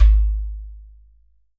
GHATAM

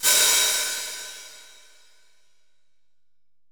909 OHH, 480L; Wizoo; Platinum 24 Electronic

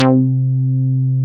Tekkno Bass; Best Service Gigapack 2

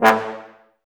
brass, Woodwind Brass Ensemble

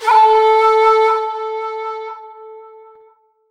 ethno, sound ethno

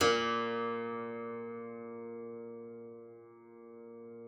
KCYM A#2, KLAVICYMB; Xsample VOL-8

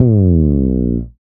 slides, bass; Spectrasonics; Bass Legends CD 1

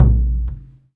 SURDO; E-MU Producer Series Vol. 5 – 3-D Audio Collection; 3D Percussives; 3D Ethnics

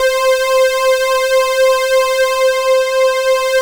JUN 106, BASSES; Masterbits - Vintage Voltage; VV BASSES

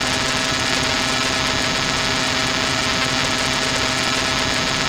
mad radio, special FX twisted

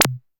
Zap

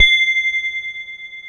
Poly800 C5, Korg; Back In Time Records; Korg Universe VOL-1

